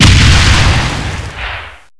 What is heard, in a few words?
explode rocket